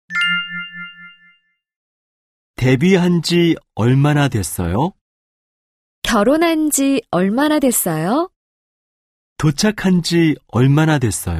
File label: K-POP